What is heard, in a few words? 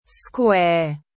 square